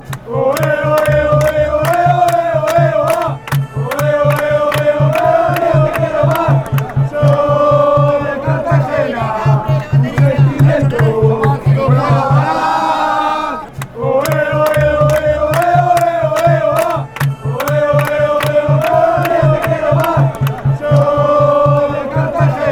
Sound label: ole, fanchants